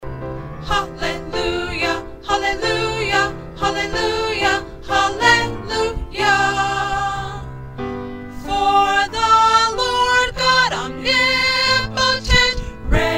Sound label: 2nd alto